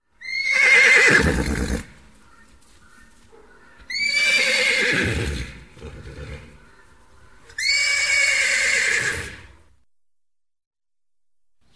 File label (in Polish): odglosy zwierzat